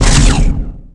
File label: Laser Ball Fire; Space; Acolyte